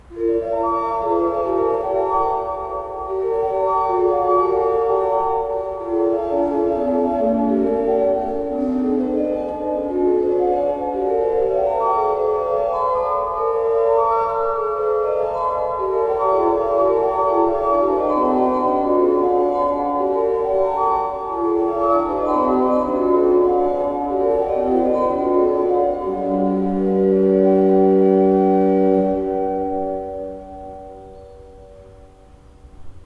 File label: pipe organs; Schuke 30 III P